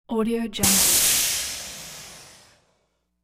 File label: hot air